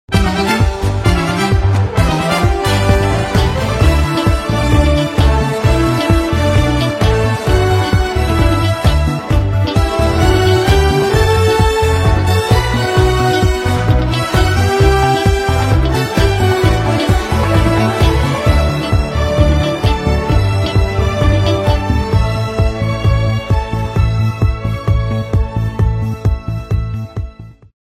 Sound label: Penguin Polka; TSMGM